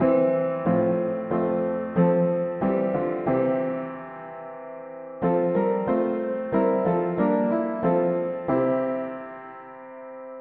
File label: mono piano, hymns; Break forth and sing the song